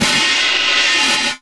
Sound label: CHINA, Anime Sound FX; COMIC